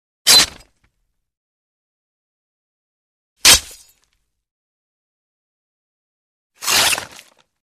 Sword Stabs With Shings Fast Stab With Light Debris; Hollywood Edge Foley Sound Library